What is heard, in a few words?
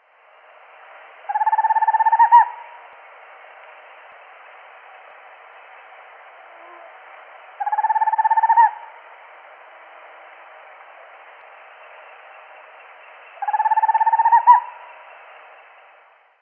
Megascops choliba